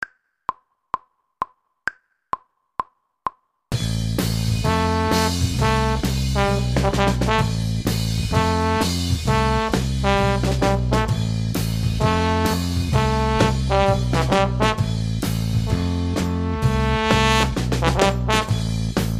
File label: trombone section